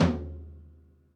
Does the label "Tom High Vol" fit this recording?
Yes